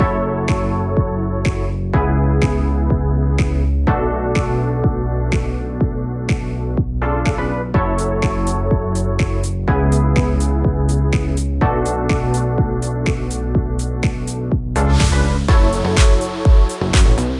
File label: Theme Inst; Popping Candy